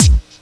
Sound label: KIT, Dance Mega Drums, HIP; Best Service